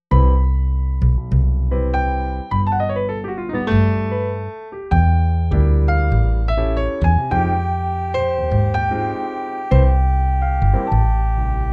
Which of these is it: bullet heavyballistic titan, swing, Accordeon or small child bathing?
swing